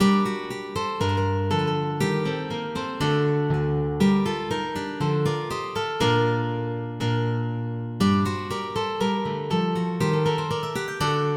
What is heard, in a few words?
Ballet pour 4 luths, MIDI